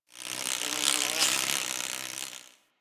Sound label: forest close insect buzz fly by; Ambience; Warframe; Grineer Forest